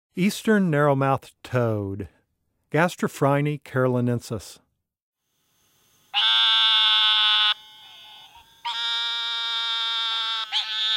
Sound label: Eastern Narrow Mouthed Toad Call